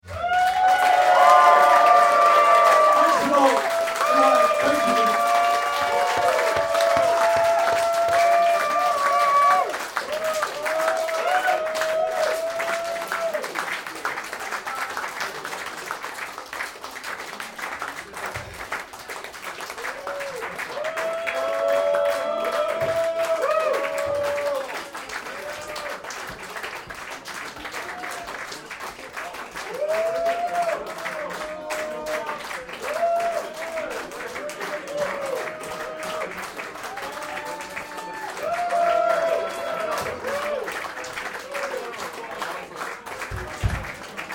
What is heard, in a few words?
Applause Goodnight And Thank You, End Of Gig; Applause Sound Effects